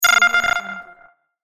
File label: ui connection